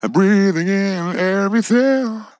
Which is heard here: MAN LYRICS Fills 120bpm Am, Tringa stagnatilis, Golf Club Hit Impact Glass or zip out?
MAN LYRICS Fills 120bpm Am